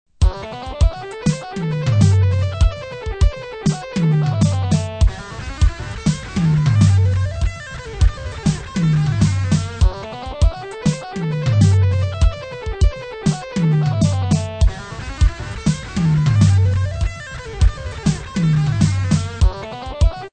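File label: Sextuplets, straight 4/4 time, 100 bpm, guitar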